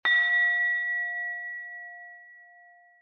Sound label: tone; organic